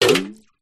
household watering can small metal full of water set down on hard ground; with water splash